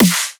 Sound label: bad snare; M8